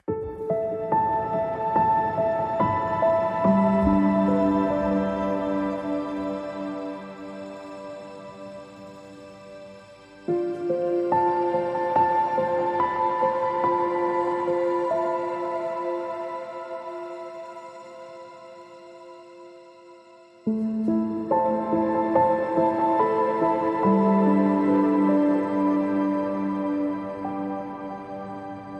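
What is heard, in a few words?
Silent Shore, ambient piano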